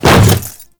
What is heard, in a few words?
Hammer Boss Step Default, footsteps